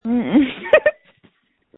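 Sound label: screams that feature laughter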